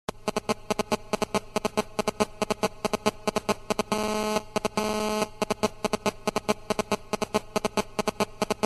handy stoerung klingelton

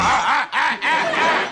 maennliches grunzen; tim taylor

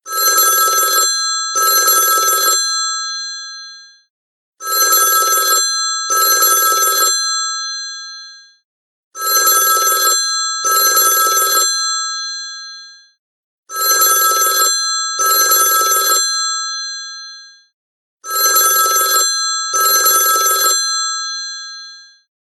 klingelton classic bell

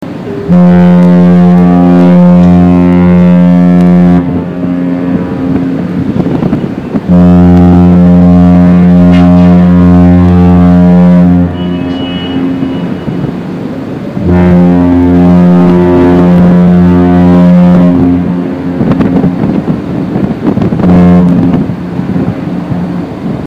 schiffshorn; halloween capt future; astra

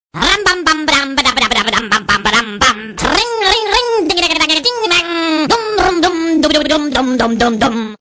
frosch

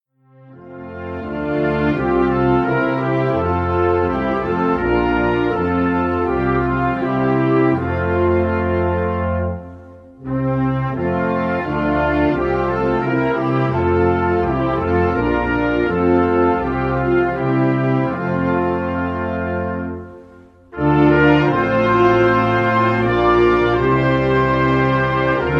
Nationalhymne Niederlande; het wilhelmus

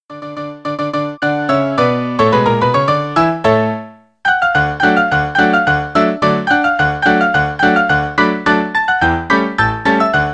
radetzky marsch klingelton marschmusik

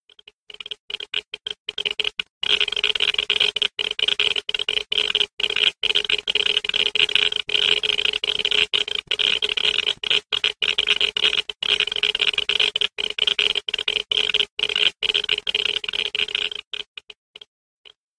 geigerzaehler radioaktiven sound

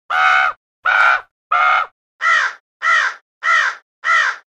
tier geraeusche als sms klingelton kraehe

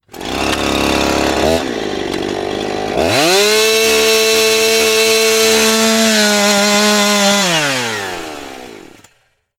klingelton motorsaege sound einer kettensaege

